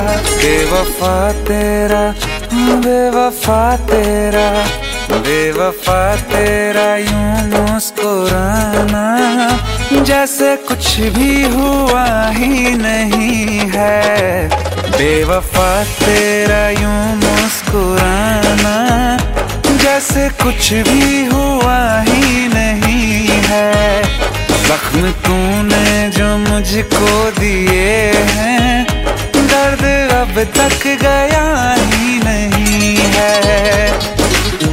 New Song Ringtone

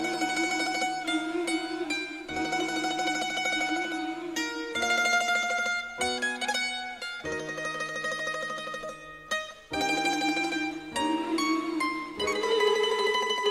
Flute Tone

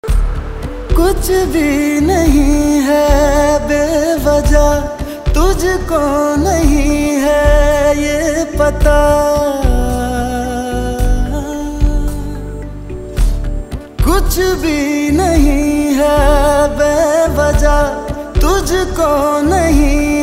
Romantic Song Tone

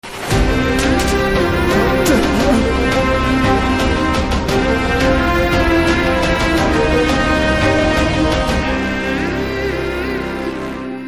Teaser BGM Ringtone; Ka Pae Ranasingam

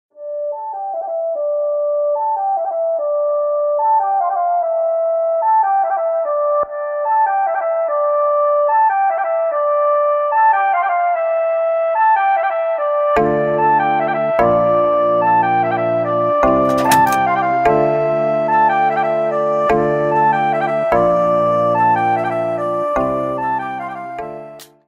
Haryanvi Song Instrumental Tone

